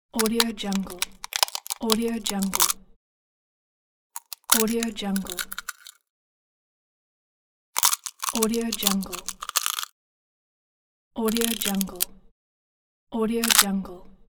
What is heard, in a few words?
hatching egg